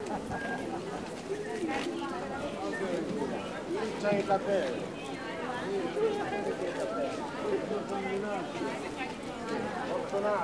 Park Guell

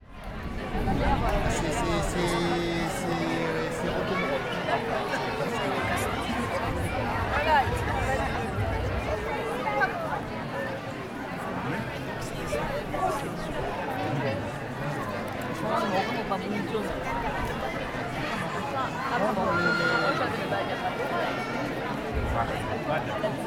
crowd outdoor

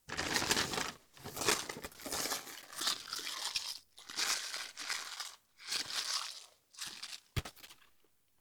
Crushing Paper Slow

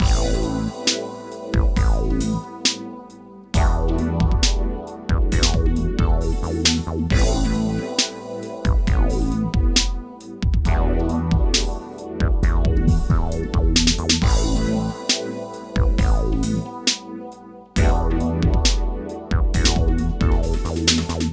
Loop Electronic

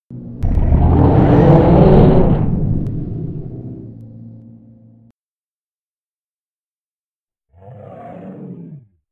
Dragon Cry; Eithra

